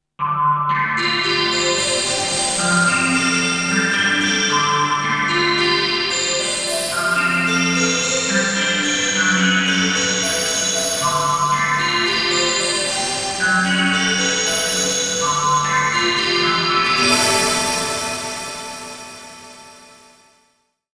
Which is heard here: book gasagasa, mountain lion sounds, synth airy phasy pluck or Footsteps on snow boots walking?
synth airy phasy pluck